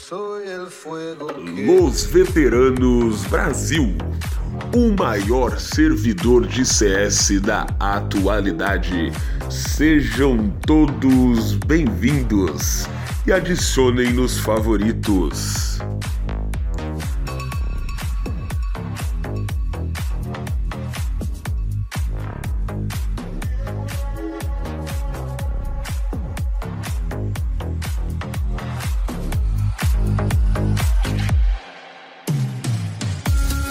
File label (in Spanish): Musica De Entrada